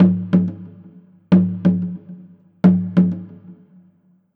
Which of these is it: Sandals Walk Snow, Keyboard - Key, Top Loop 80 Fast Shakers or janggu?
janggu